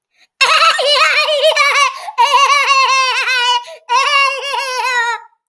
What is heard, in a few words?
goofy laugh baby edition